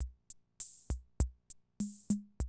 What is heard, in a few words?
CR78, DrumLoops